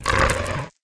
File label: poison plant damage, monster